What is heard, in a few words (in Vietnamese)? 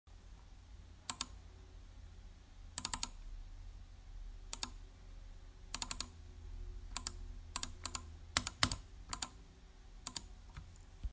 tiếng click nhấp chuột máy tính nhiều lần